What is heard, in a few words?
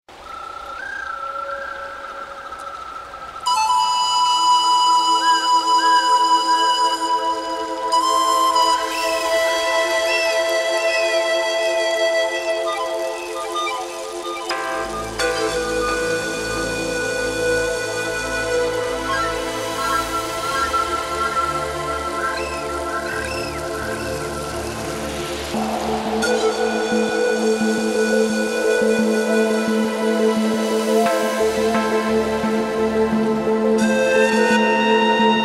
Silent Rain, Pan Flute; Pan Flute & Paixiao 排簫